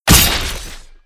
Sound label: damage